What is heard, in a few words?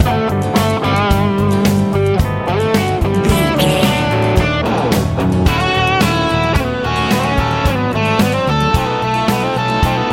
blooming good swirl big love easy rock pop